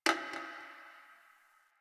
Garbage Metal Can

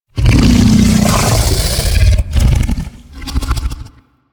Vocal Idle, critter; Warframe; Hunting Critters; Undazoa

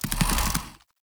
Infested Big Ashen Move Turn; Warframe

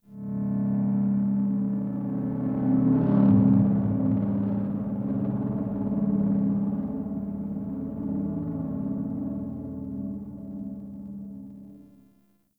Gritty Pulsing Drone; Ambience; Warframe; NineteenNinetyNine

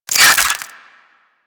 Infested Ashen Spike Cast; Warframe

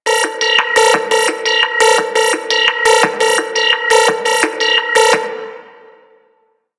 Riot Moa Mine Proj Loop; Warframe; Attacks

